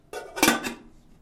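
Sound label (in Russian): металл накрывание кастрюли мягкий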